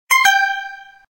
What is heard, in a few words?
pling plong